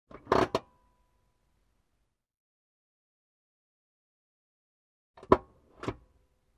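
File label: Car handbrake sound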